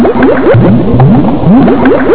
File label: bubbles